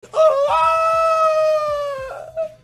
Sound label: bloop uwaaaaa; lowtiergod